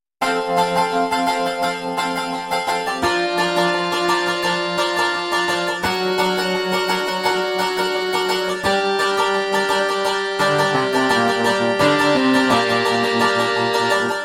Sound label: Mandolin; MidiGuitar